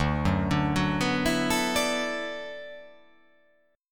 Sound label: D 6th Add 9th D